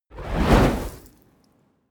whoosh fire ball crackle small

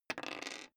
dice roll table soft

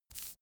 cigarette sweep smooth

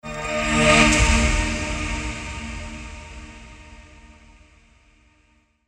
swell accent

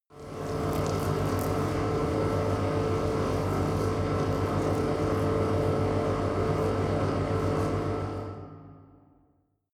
machine room wet

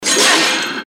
short industrial event

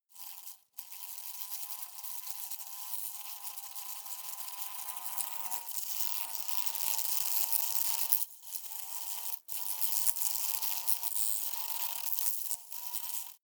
hornet hum glass aggressive long

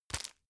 tissue grab

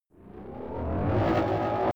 huge weapon loading

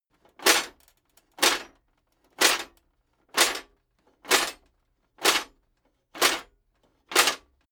metal plate armor movement sequence

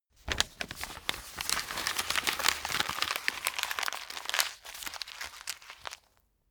sheet crumple

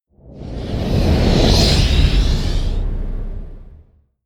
whoosh air

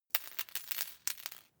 paperclip drop floor few

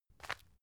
tissue package pick up

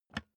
office chair lever down

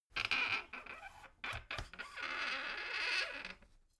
folding chair squeak low

